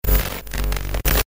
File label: Computer glitch accent